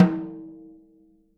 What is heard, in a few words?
SN OFF, snares; Sampleheads; Peter Erskine Living Drums